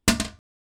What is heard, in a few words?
Toilet seat lid Hard